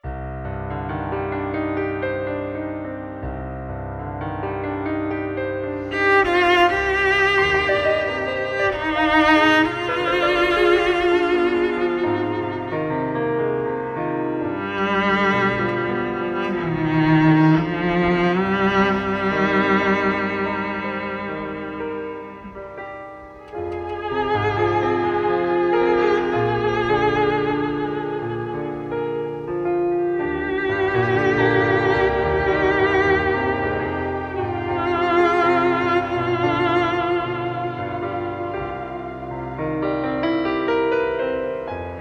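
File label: NOCTURNE cello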